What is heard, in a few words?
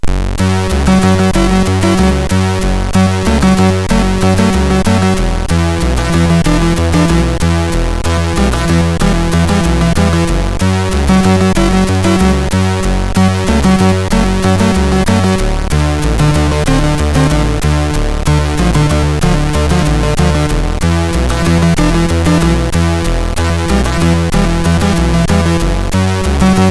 Hip Hop, sid MOS6581R3; Mermaid; Early Tunes